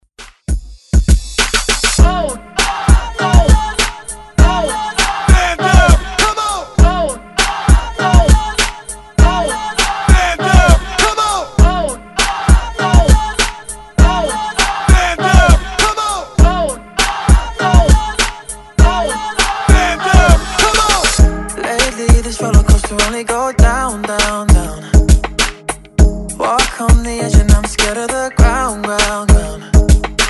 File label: Hype Redrum